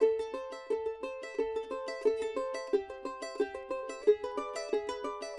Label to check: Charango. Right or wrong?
Right